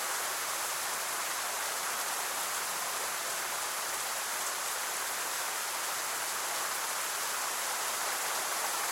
Rain; DCS

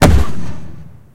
atgm punch, guns tanks; War Thunder

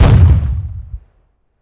Explosions Explode Ground; DCS

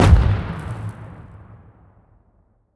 survey charge explosion; Rust

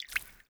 city occasional

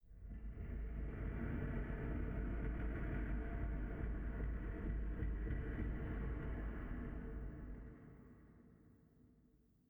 sting metal groan; Rust

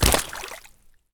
swamp landing; Escape from Tarkov

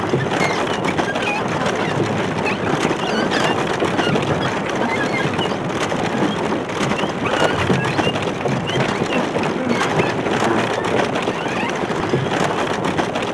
Windmill Worked; Civ4 warlords; Resouces and Improvements

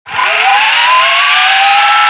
gatling spinup full; Modern Warfare; minigun

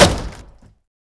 Wood Shield Hit; Civ4 warlords

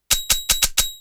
ejecting beeps; Titanfall 2

